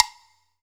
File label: Low Block, Pecussion Hits; Zero-G Jungle Warfare 1, 2 & 3; 90 s Sample CDs